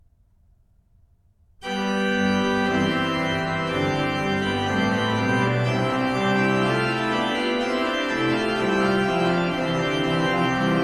Dies sind die heilgen zehn Gebot', BACH ORGAN, Chorale preludes; Orgelbüchlein